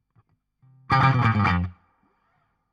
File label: Guit Solo Back